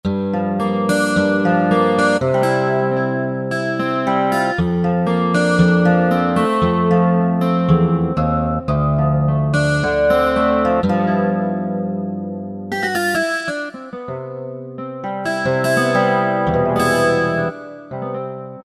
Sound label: Guitar 2, Korg M1; Factory preset examples